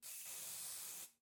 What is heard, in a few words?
Aerosol Spray Short, Bathroom Sounds